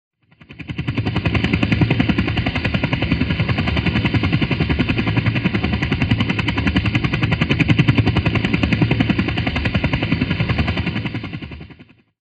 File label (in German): Helikopter